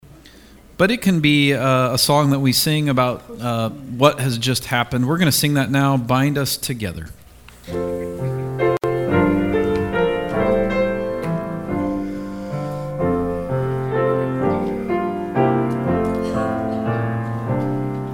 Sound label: Hymn